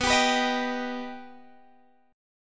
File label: B Augmented B Guitar Drop D, chord